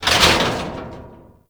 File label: Military Checkpoint Metal Hit; Warframe; Ambience; Nineteen Ninety Nine